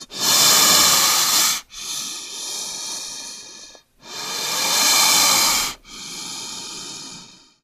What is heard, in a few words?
Snake, Bull Breaths, Multiple. Close Perspective.